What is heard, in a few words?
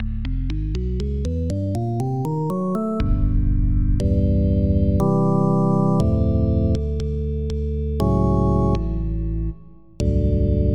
Hats 2; Onj's Soundfont